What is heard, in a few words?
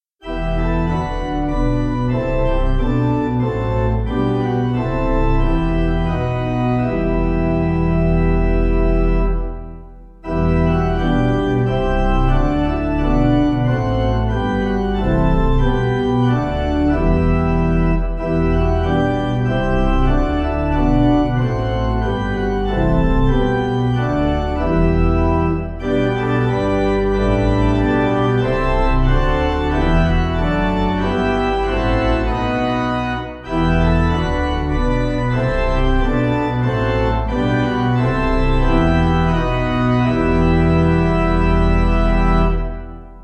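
Pipe, Piano, Band, Pipe Organ; Maradj Immar; Naray Lyra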